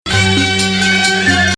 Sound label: Hijaz, maqam; Rast